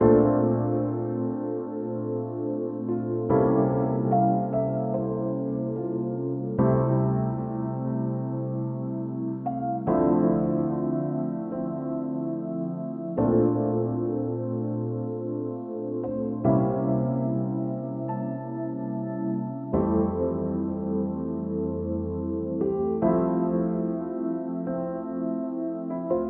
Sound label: Lounging Smooth Keys